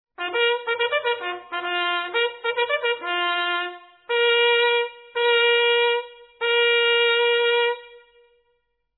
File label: Company Sgt Majors